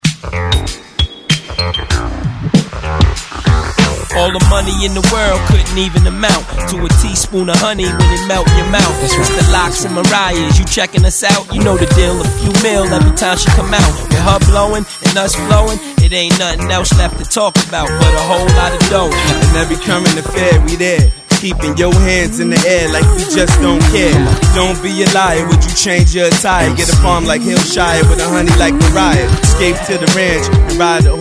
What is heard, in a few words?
Throwback 90s Intro; DJDOLLS